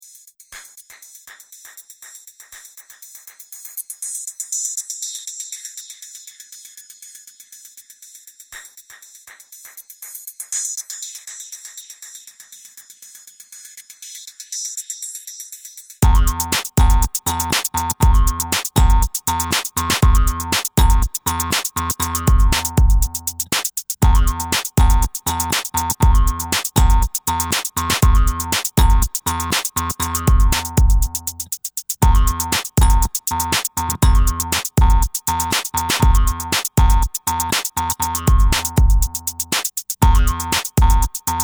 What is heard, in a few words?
Warp Harp